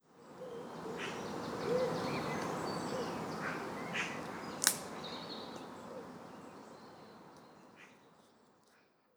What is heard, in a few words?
birds and snapping branch